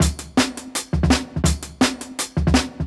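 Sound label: Jungle Breaks, 167 bpm; 90s Sample CDs; Original Jungle Breaks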